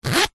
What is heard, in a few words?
wallet coin compartment zipper fast zip; foley; zapsplat